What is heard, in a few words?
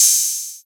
open hi-hat, Drums; Ghosthack